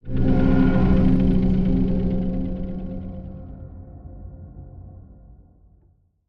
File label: Stinger Lost Tunnels; Unto The End; Xbox Series X|S